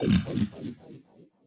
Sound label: run away; battle